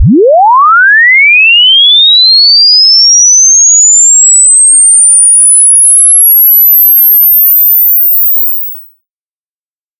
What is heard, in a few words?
glissando